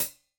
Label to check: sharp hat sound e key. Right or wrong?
Right